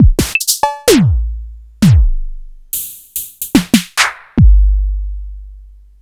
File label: Yamaha TX16W Typhoon-OS